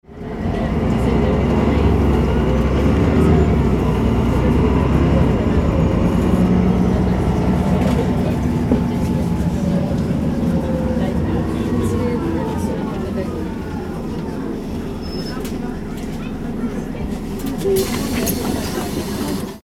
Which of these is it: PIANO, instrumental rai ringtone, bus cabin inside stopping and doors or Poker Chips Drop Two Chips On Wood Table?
bus cabin inside stopping and doors